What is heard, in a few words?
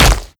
step close, zombie blind; Half Life Alyx; creatures